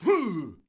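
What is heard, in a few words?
Knight Attack Vox; Civ4 warlords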